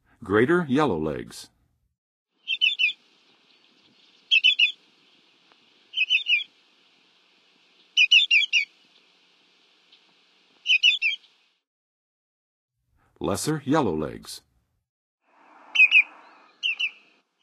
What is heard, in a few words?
Greater Yellowlegs, Birds of North America; Western USA; Western USA Songbirds